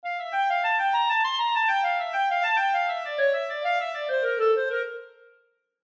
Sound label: Clarinet; examples in treble clef